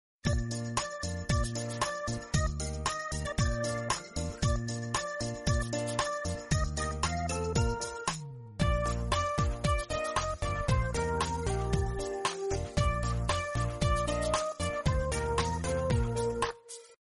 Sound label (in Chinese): 套圈圈背景音乐